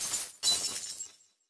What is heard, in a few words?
Ice; New The Denpa Men